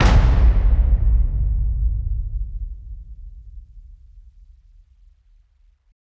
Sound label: Tension Impact